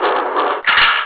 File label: gate close